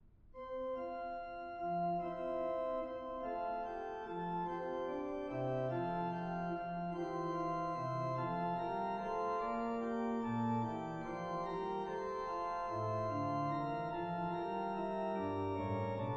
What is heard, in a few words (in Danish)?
forspil F dur; Alt hvad som fuglevinger fik